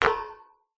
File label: impact metal pole hit gently with stone